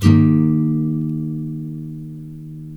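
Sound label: NYLON, D# MAJOR UP, CHORDS; Spectrasonics; Hans Zimmer Guitars VOL-1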